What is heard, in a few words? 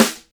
nice snare a key, acoustic snare sample tuned to the A note. Loudest frequency: 2589Hz; drum single hits one shots